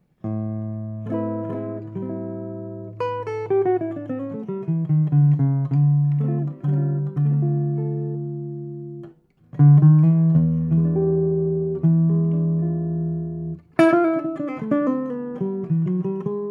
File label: slim body, vintage electric guitars; benedetto slim body 17; first ever slim hollow body by bob benedetto